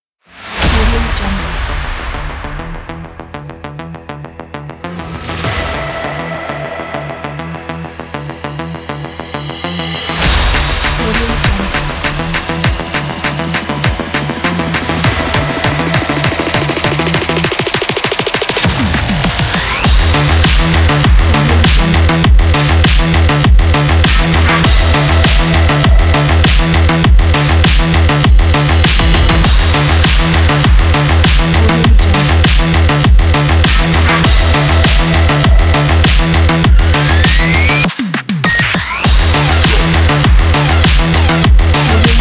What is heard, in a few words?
the dub step